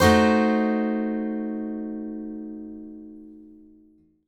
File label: ACCGTR DIMCD, Whole Lotta Country